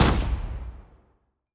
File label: infantry fire standing; Hearts of Iron IV Death or Dishonor